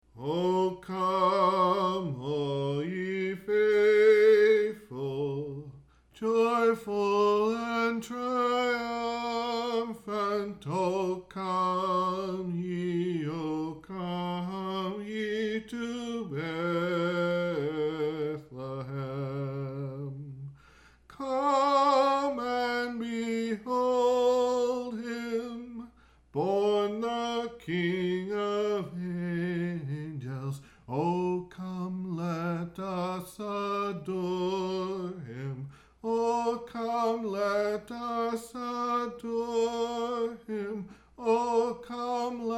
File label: O come all ye faithful, Raw Voice Recordings